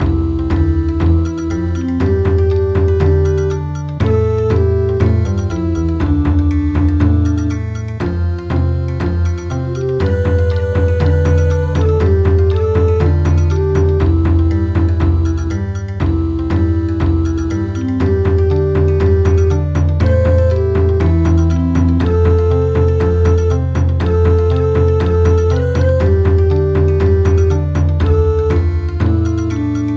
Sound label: Bon Odori